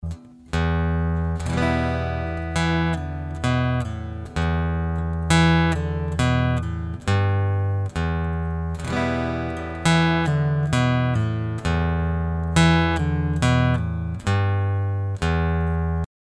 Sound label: ACOUSTIC PULL OFF